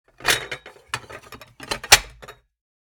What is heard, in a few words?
Kitchen furniture stacking plates